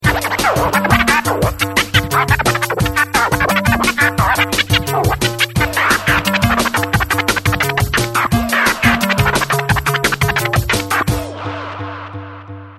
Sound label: drum base scratch; ringtones; hip-hop